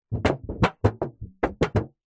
metal tin lid flex bend; zapsplat

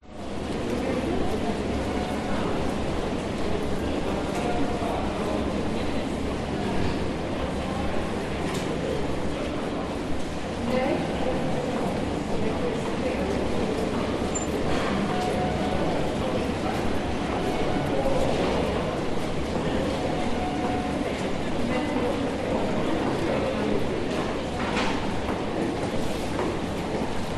Shopping Mall; Audio Hero

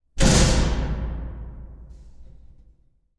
impact metal large sheet clang

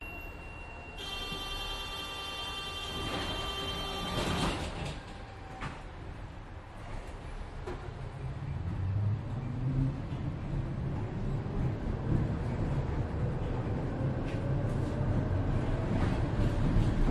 Tram Ride; Budapest Hungary; your free sounds

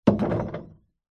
basketball hit backboard

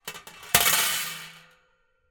impact metal grill on metal surface

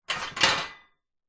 metal frame loose set down clatter; zapsplat

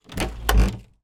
front door open internal; household

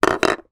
toy plastic small hollow down on surface

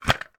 game plastic sword insert in barrel; pop up pirate

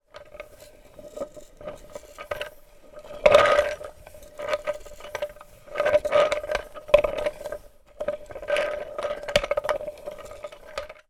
toy wooden cart small pull along floor

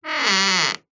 household door wooden creak short

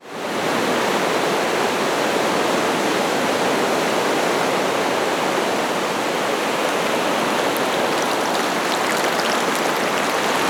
ocean waves wash up close distant surf; zapsplat

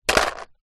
vehicle car hubcap plastic land in road

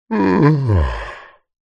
fantasy giant male sigh

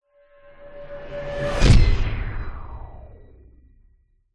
sound design whoosh sci fi pass hit; zapsplat

